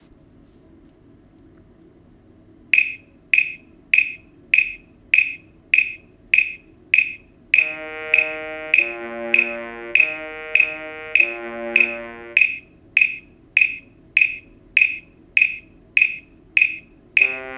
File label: trombone bass